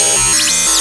burst, sound canvas

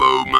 talk box boom

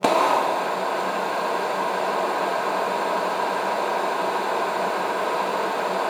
luft, alto sax; Xsample VOL-2

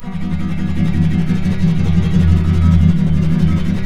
F M, Guitars; Spectrasonics; Hans Zimmer Guitars VOL-2; FLAM TREM CH

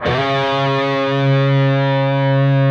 PRS D, Guitars, LEAD

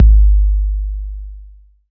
BD808, TR-808; 90 s Sample CDs; Sound & Vision - Gigapack I CD 1 (Roland); TR-808 & 909

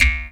jaw, Didgeridoo and Other Primitive Instruments

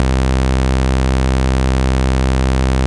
mini, analog bass; Roland LCDP02 Guitar and Bass

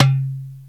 ETHNO DR, PERCUSSION; Crypton - DrumBase5000

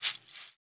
RAIN SHA; Spectrasonics; Liquid Grooves; SHAKE IT UP

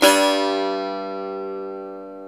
SAZ, Eth Plucks; Pluck Duo

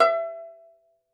Tenor Banjo, Plucked Strings; E-MU Producer Series Vol. 7 – Old World Instruments

